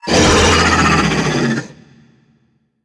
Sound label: Monster Bonus Win